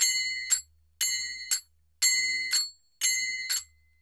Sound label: Perc, Percussion Latin